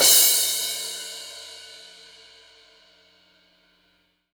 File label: crash b key; tuned cymbal